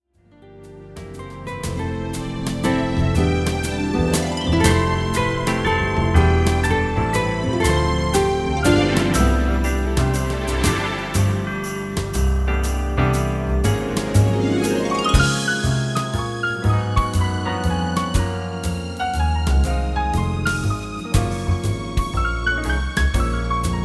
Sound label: Contemporary Orchestra